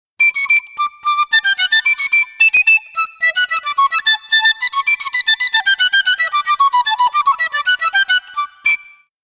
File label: piccolo